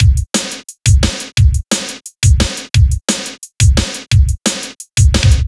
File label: DnB